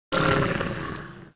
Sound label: Wolf Hit; Bestia